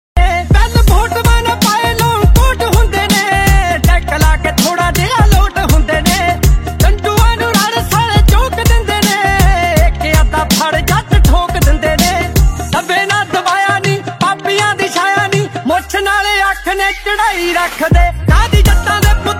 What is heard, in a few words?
new punjabi song ringtone